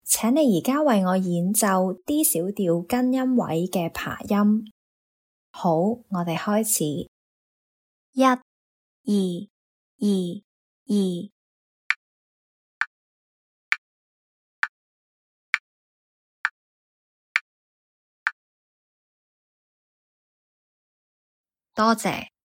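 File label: D minor arpeggio root position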